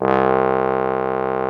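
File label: trombone C1; Greytsounds VOL-1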